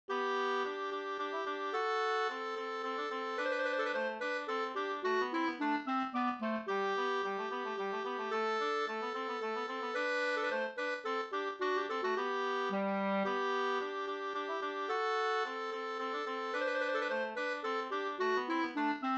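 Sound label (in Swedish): slangpolska, two-part; Norrland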